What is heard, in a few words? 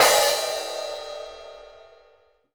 Hi Hat Open; Essential Percussion